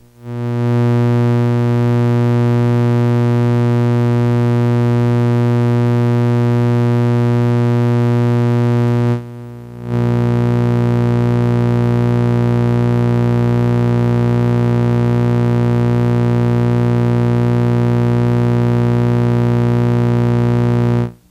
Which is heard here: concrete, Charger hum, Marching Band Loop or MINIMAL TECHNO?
Charger hum